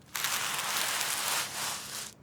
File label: sound Marsh Wall Slide; freedom fall